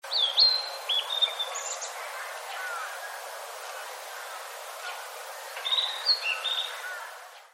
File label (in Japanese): oruri